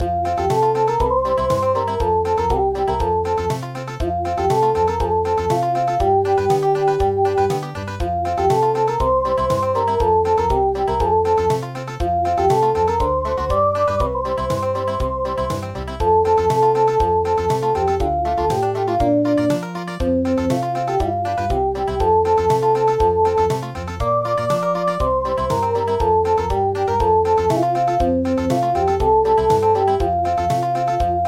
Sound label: Country